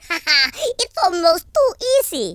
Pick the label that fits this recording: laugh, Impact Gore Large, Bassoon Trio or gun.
laugh